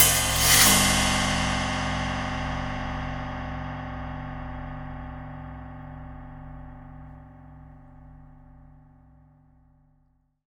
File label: Cymbal Hit, Impact Drum Hits; Sonic Foundry (Sony Creative Software); The Electronic Music Manuscript Collection